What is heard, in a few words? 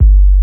BASS, 150 BPM; Ueberschall; Drum & Bass Resonance